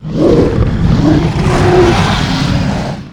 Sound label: attack; tera creatures; alpine predator